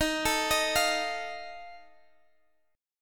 Eb Suspended 2nd Flat 5th, Guitar, Open G

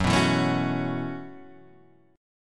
B 7th Suspended 2nd Suspended 4th, chord

